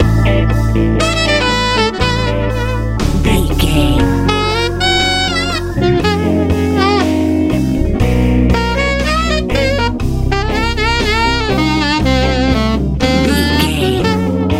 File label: easy listening soul